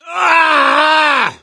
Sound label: death scream, gambler, survivor voice